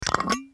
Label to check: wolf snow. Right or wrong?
Wrong